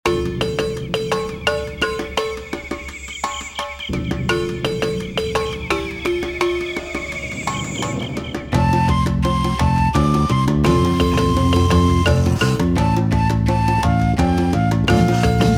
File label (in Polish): piosenka z afryki akompaniament